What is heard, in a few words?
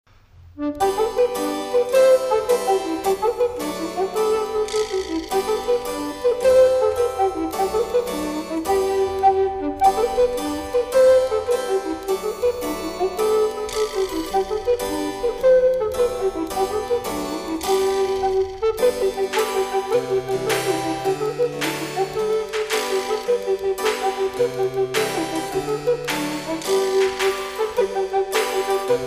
JIG